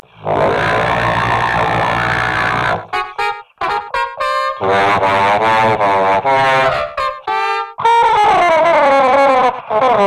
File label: Horns!